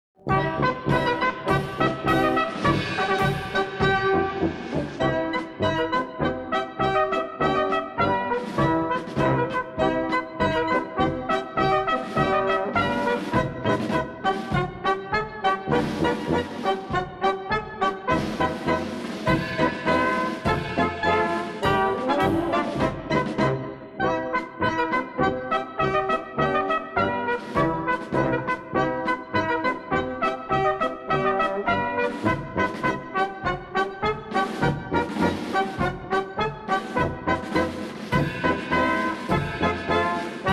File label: polka